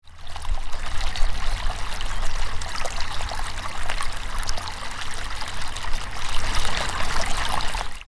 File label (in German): wassersprudel